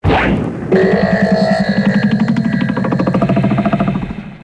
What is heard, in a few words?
teleport; téléportation